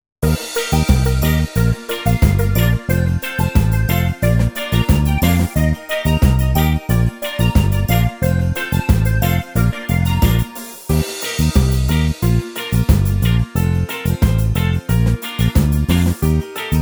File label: Karaoke